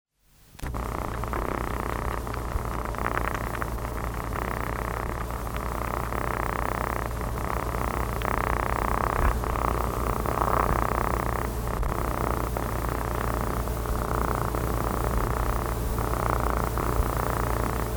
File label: ingressive genetta tigrina purring